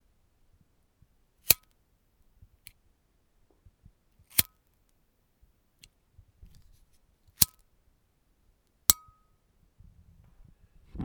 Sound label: lighter flick